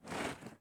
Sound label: prone asphalt; Escape from Tarkov